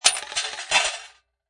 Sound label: Coin Slot, Casino, gambling, Slot Machine: Coins drop into coins cup. Small coin payout